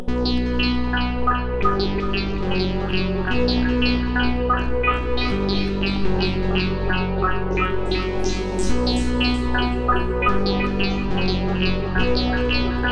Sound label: the formant base